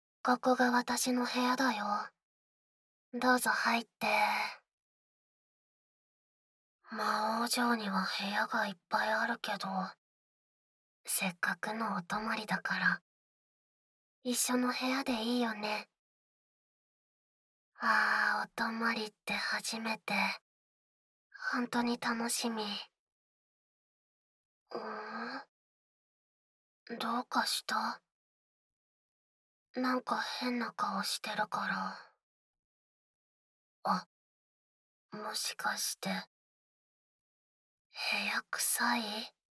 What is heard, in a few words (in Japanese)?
耳舐め手コキ